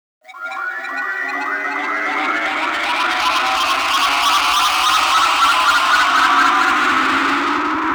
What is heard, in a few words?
NRG SFX, Trance